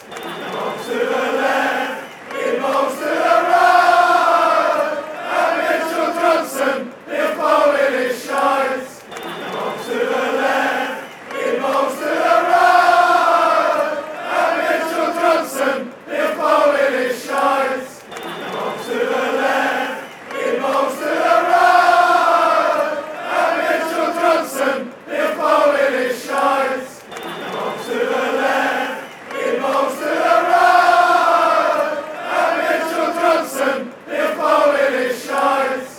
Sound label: he bowls left, fan chants; mitchell johnson